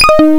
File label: string organ; 40,000 sample rate; 0.04 seconds